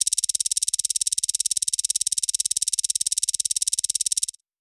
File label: Counter, Infographic SFX; CB Sounddesign